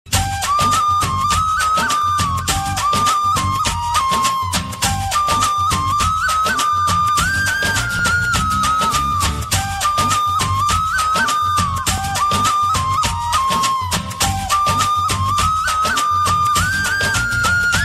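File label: background music Telugu Ringtone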